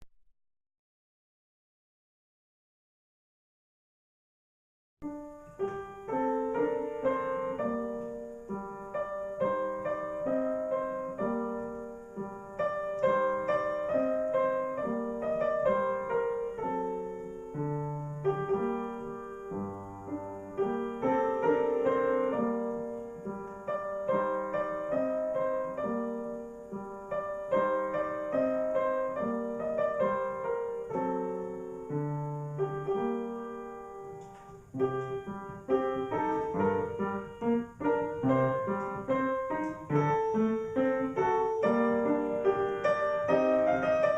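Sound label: melodie antique francaise piano; tchaikovsky; op.39 n16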